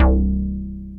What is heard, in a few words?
EII MOOG; OTHER SYNTHS; EMU; Rubber Chicken Software Sample Bank